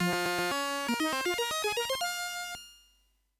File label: ditty; earthling